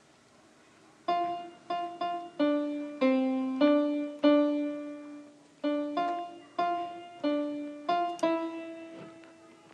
piano

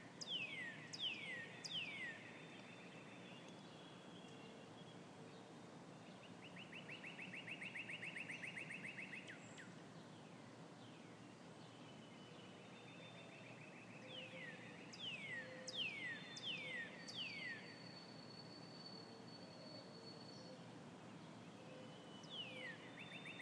dawn chorus in brooklyn

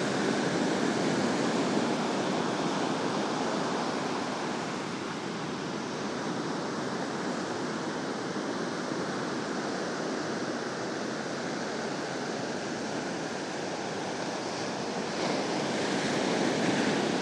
hermosa beach ca

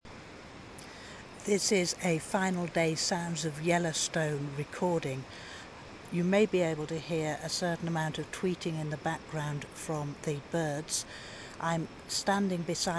lava creek sounds

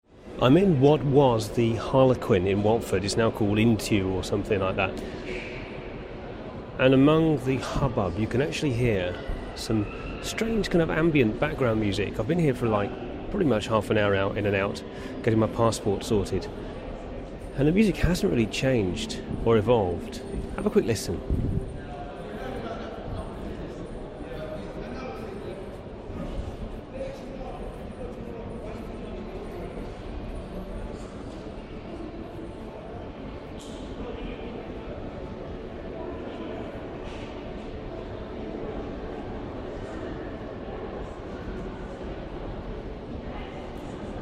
weird and indistinct musical soundscape; at intu watford

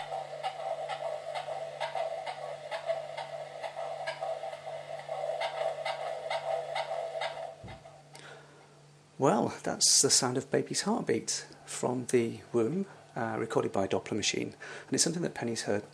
baby s heartbeat

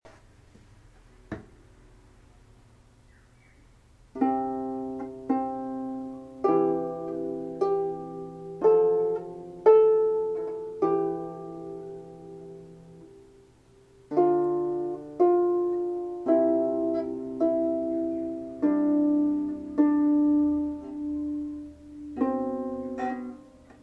twinkle twinkle on the harp; 5 days after 1st lesson

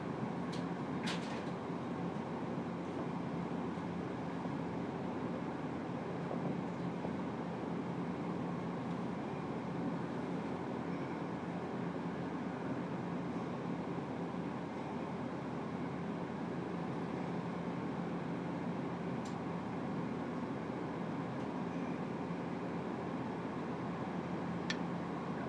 office ambience with view on to hotel backyard; sound of bottle clearance not included

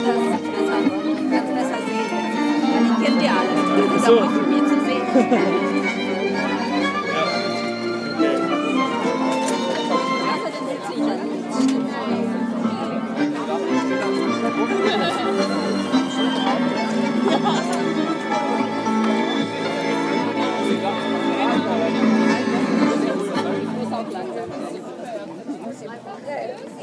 tango an der spree

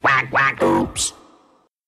quack quack oops

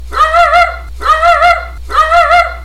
bark

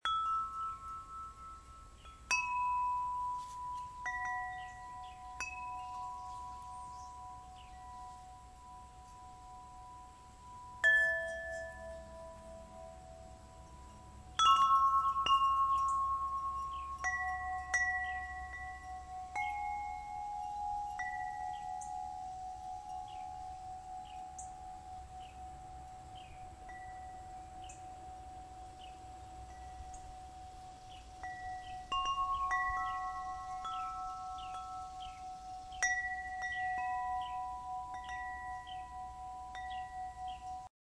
windchimes; i have lovely windchimes

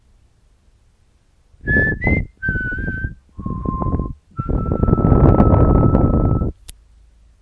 whistle

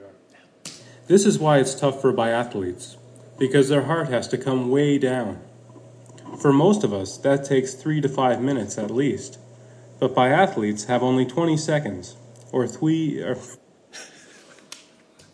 short breaths

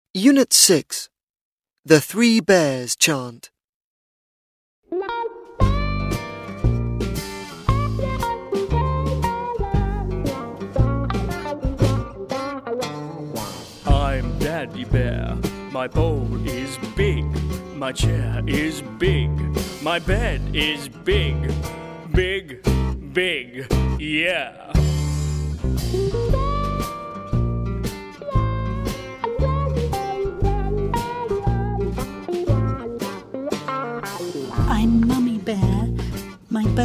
chant; the tree bears

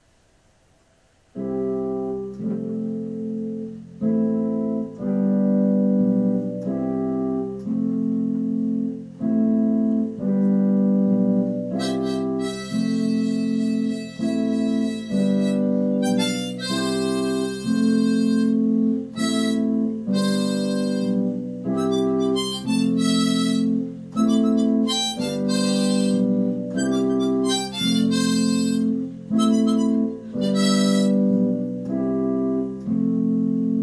improvisation

